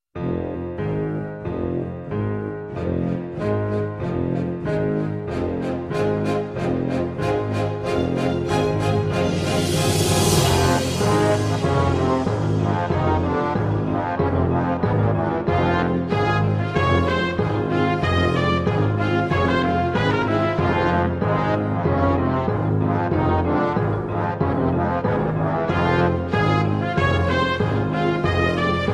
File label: Scary March